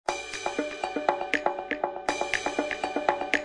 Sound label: latestart jazz percussion, 120 bpm